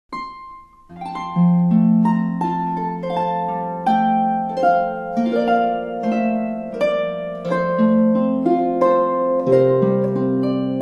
away in a manger hush now hush, christmas carols on the harp